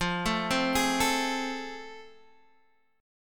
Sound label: A Minor Major 7th Sharp 5th, F